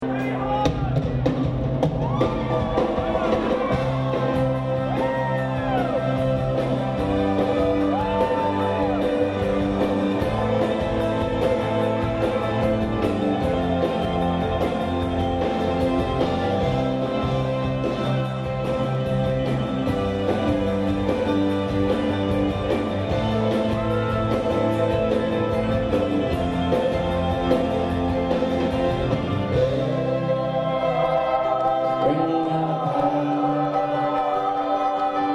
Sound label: Ring The Bells